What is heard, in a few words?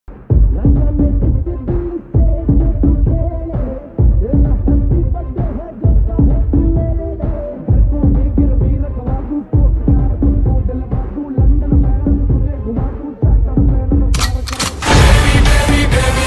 slowed hindi ringtone